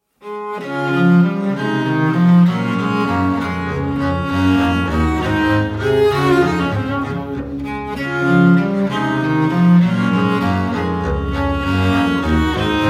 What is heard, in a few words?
Divertimento D-Dur Menuet Allegretto; Haydn; Sperger Trio